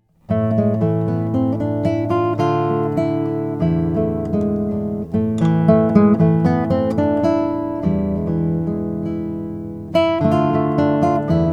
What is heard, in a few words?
lowden s32j as alpine spruce top